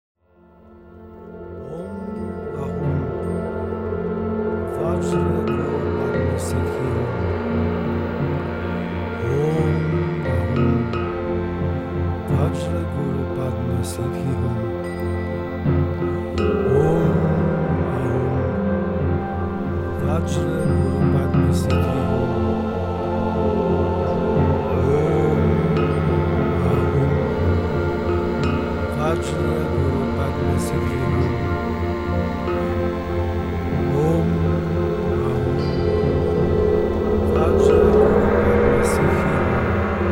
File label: Vajra Guru Mantra